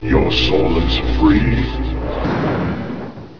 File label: EXIT Sound; Escape! (2.1)